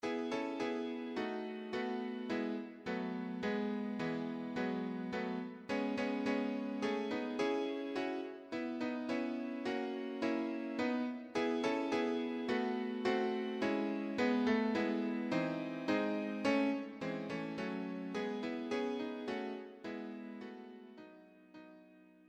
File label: piano